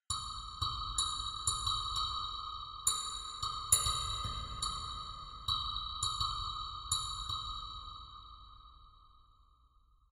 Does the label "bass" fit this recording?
No